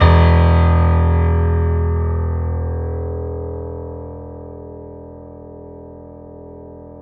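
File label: piano E 0, Korg; Back In Time Records; Korg Universe VOL-1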